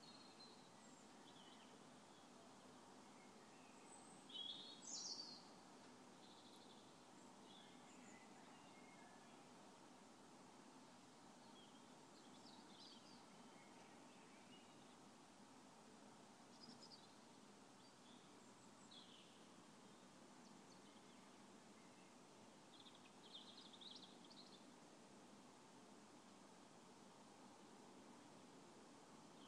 equinox birdsong outside my window

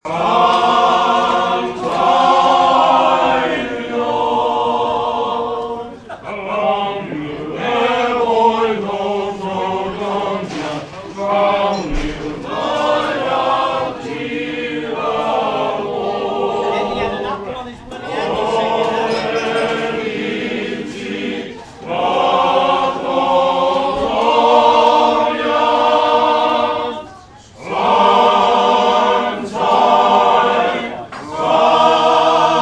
Welsh male voice choir singing; in the albert llandudno; bbc sos

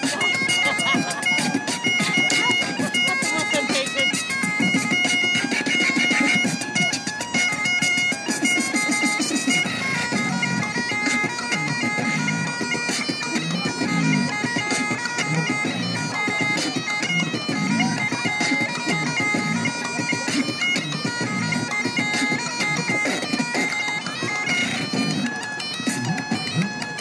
bagpipe beatboxer mashup in Union Square

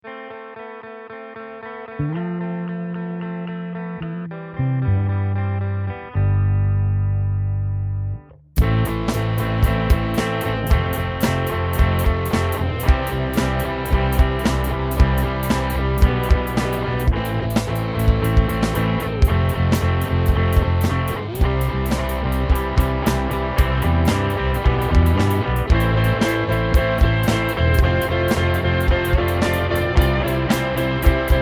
4/4 rock beat

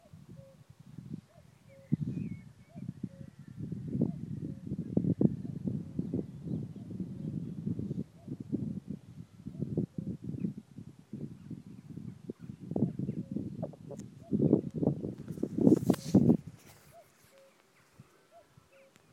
cuckoo from snake path

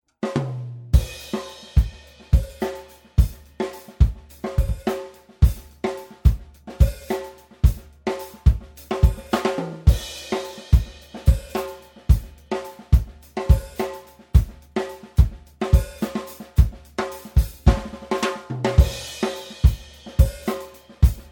head drums

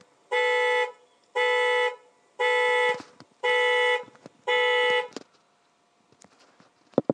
car alarm; for noise action week

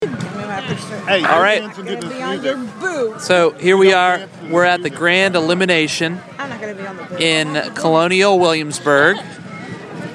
grand illumination sounds

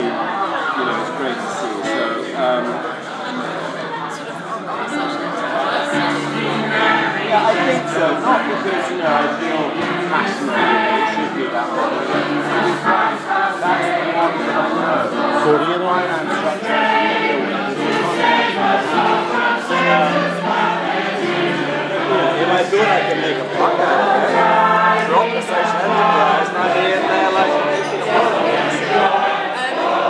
st johns hoxton choir

